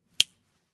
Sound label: computer click